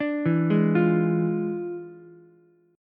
E 7th Flat 9th E down Ukulele C6; arpeggiated; chord; JGuitar

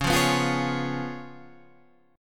C sharp 7th C sharp, Guitar Drop D

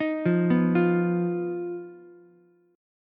Eb Suspended 2nd Sharp 5th, Ukulele; arpeggiated; chord; 8,5,7,8; JGuitar

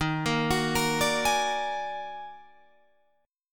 D sharp Minor Major 11th, Guitar, Drop D

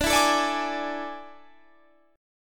A Major 7th Suspended 4th D

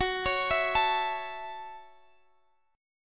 A 6th F sharp; A6/F# arpeggiated

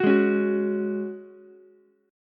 Gb Suspended 2nd, Ukulele; strummed; 11,8,9,9

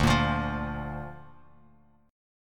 D Minor Sharp 5th D down Bass Standard; Dm#5 strummed; JGuitar

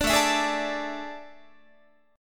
A Major 7th Suspended 4th, Guitar

